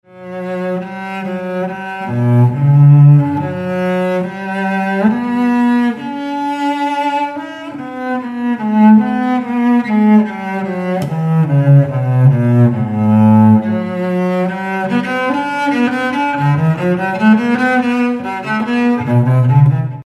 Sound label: Solo Cello; Canon; Pachelbel